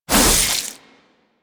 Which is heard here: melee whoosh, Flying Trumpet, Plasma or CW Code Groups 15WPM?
melee whoosh